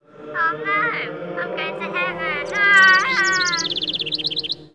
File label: Die; ScummVM; Simon the Sorcerer Puzzle Pack (CD Windows)